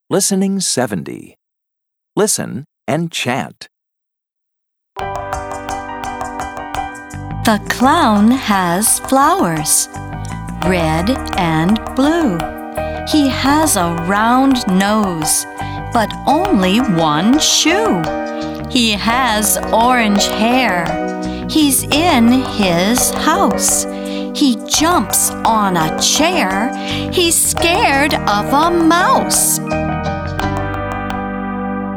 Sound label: Chant